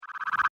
Scanning, science fiction sound effect; Scanning The Future

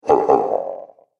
Alien Ogre; Alien Sound Effects

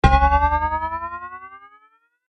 Cartoon Boing Effect

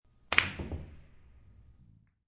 Pool Shot; Party Sound Effects; Sound Effects:Bars

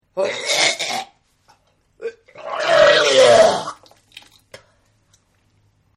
Vomit; Vomiting; Human; Partners In Rhyme

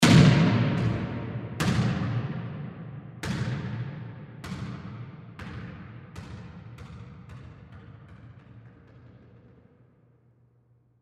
Basketball Drop; SPORTS; Blastwave

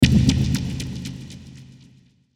Mouseover Impact Delay; Multimedia; Blastwave

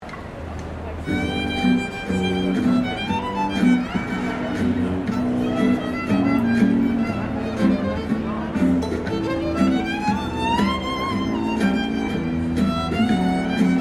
Ambience Melbourne Busker Violin; Very cool Violin busker inMelbourne city; Concert Sound Effects; Foundation1

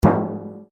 RUBBER ON METAL HOLLOW

